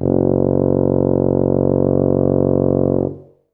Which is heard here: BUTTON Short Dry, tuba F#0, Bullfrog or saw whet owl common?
tuba F#0